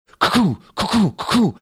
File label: making nail gun sounds